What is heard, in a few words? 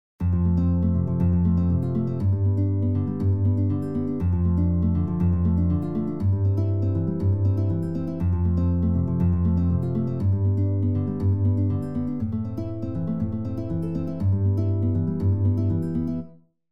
Nylon Guitar